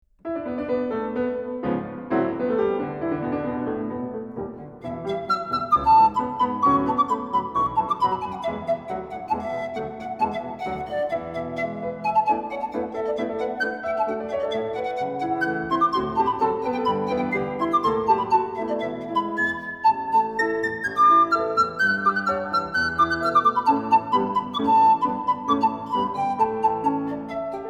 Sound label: recital